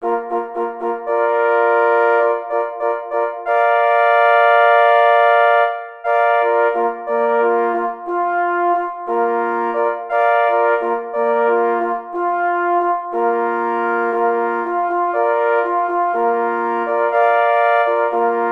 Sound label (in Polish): Hejnal na 3 plesy; Cz. Trybus; Hejnal strzelcow